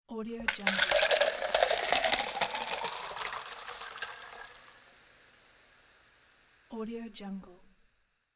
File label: long pitcher pour with ice; reanimateaudio